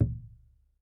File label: PUEBLO DR, American Natives; E-MU Formula 4000 Series Vol. 4; Earth Tones